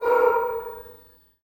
DOVECALL, Heart of Africa; Spectrasonics; BIRDMEN

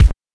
BANK1 BD, NES DPCM; MIDINES

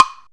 woodblock; Zero-G Ambient 1; PERCUSSION

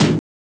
snx drum; Amiga Soundtracker Sample Packs

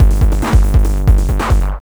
PW 909 140, Hard & Loud Techno

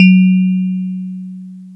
DX PIANO

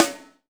SUPER RM, Drum Mashines, SR-16 DRUMS; EdgeSounds

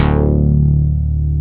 MINIMOOG

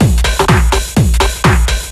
DRM 125, House; Best Service ProSamples vol.10 - House; AKAI

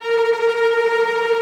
CL TREM F, Orchestra; AKAI Advance Orchestra Upgrade 97 Vol.1

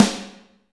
Snare, Alesis DM5

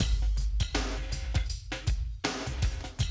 Classic DrumLoops; Best Service; XXL 1500 Classic DrumLoops; Sample CDs

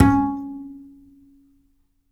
Upright Acoustic Bass; OPTIMAL; Larry Seyer

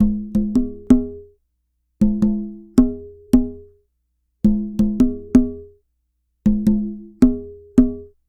Tubano, African; Sonic Foundry (Sony Creative Software); Continental Drift Aftershocks

